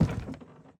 FS Wood Civilian Crouch, Footsteps; Levan Nadashvili

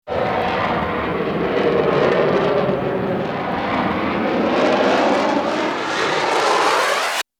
Bullet Time; FXHome; Bullet and Gun

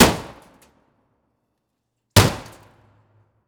Impact Metal; The Sound Catcher; The Abandoned School

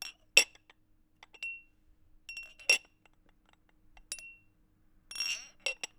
Glasses handle, Bar; NTG-2; Bar Effects; Qbsound Studio

